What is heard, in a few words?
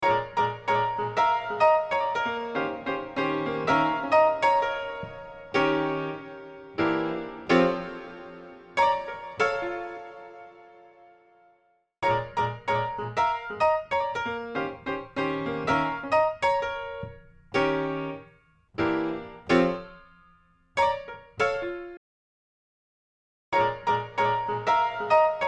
Piano; Tverb; Preset: Story Piano